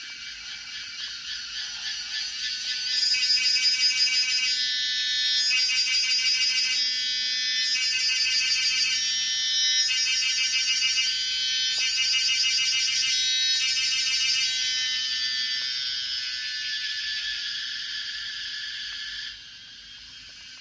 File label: Trombonia yayeyamana